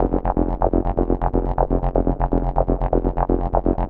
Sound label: Arp Synth, Trip-Hop; Sonic Foundry (Sony Creative Software); Rondo Brothers Trip-Hop Loops & Samples